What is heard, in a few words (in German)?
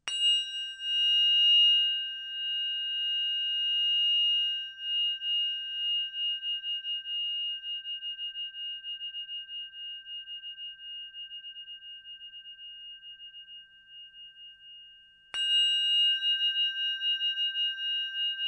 klangpyramide; crystal pyramid sounds